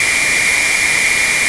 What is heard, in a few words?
noise tone